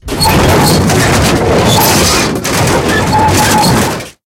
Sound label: scrape, tanks